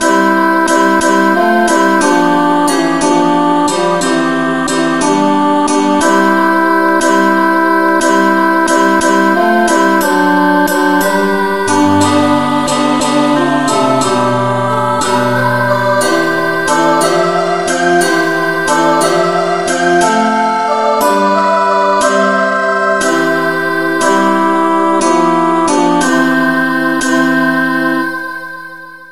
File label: angelvoices no vocal key c